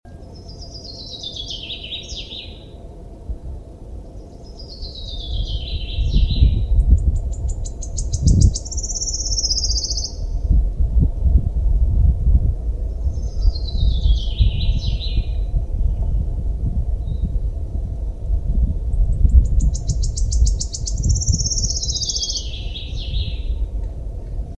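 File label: wood warbler voice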